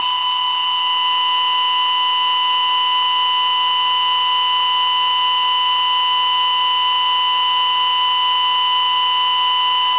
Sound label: emergency broadcast sound